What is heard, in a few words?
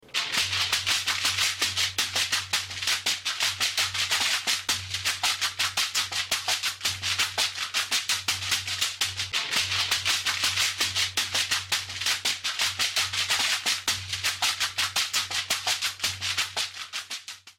sekere